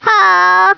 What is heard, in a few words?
HAAH; GUBBLE